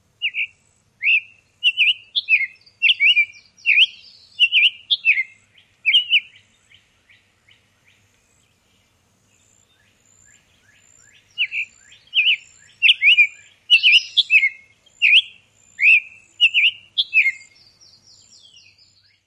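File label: songs, Summer Tanager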